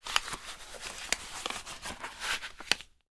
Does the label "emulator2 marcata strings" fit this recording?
No